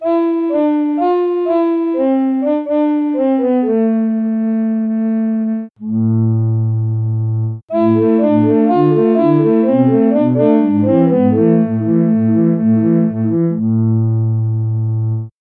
recorder

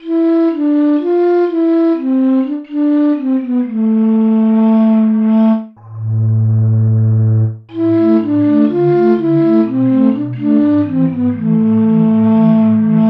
piccolo

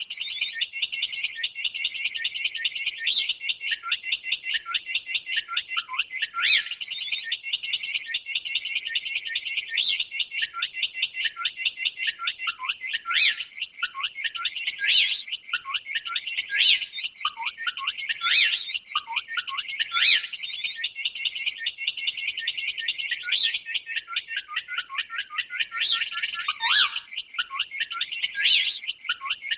bird tweet

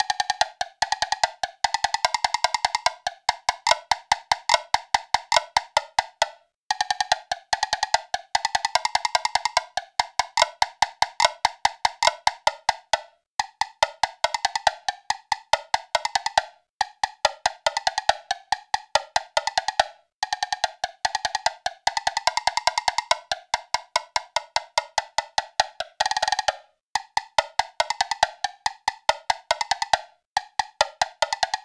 woodblock

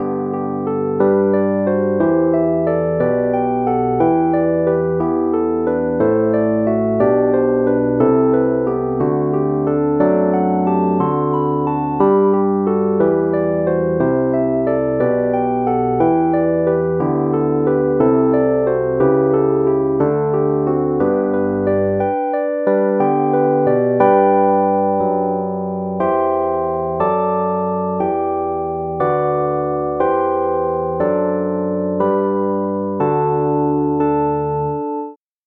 rhodes ep bach

